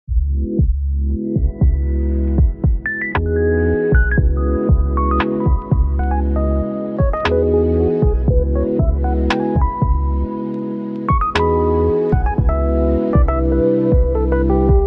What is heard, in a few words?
Jazztronica Beat